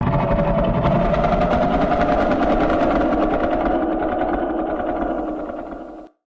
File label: Figure growl; DOORS